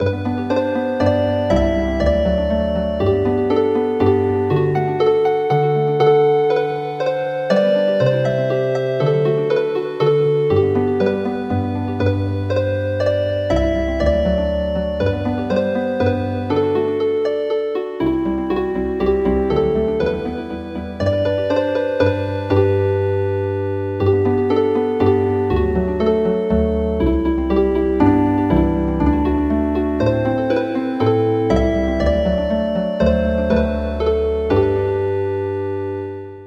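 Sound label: Healing Harp; We love the heroes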